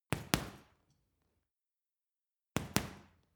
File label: boxing punching bag hit double; sport-freizeit; boxen